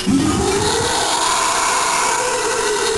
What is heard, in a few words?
Roar; GBA; Donkey Kong